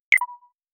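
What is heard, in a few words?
futuristic minimal shutter; monochrome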